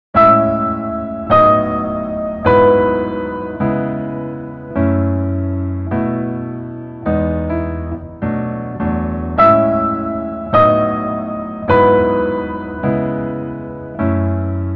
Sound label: Piano ver